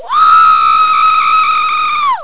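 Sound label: scream female; PEOPLE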